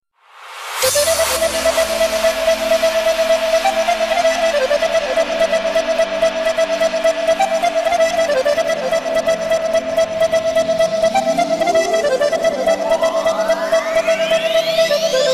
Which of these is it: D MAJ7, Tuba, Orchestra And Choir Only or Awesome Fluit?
Awesome Fluit